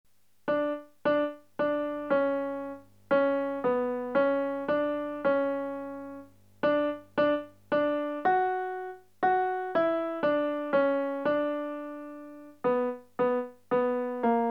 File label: Contralti Uomini; Discendi Santo Spirito